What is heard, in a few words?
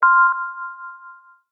correct